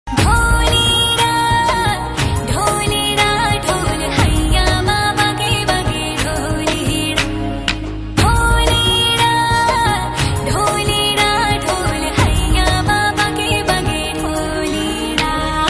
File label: Female Version